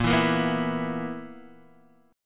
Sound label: B Major 7th Suspended 4th Bb